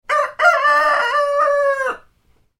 cockadoodledoo

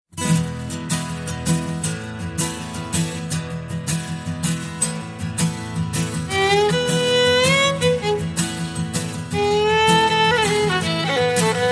violin ringtone

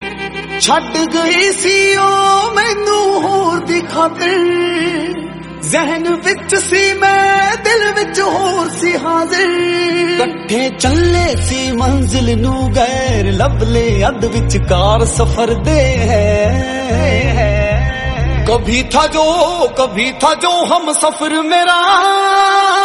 Punjabi Ringtone

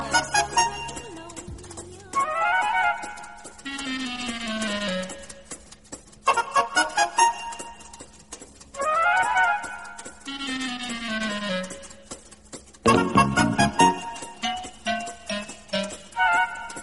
ring sms ring sms

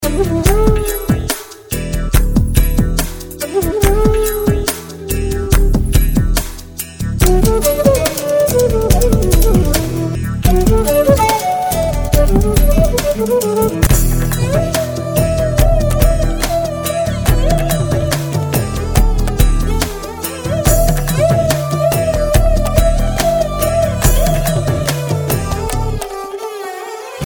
flute

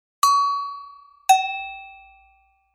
ting tong

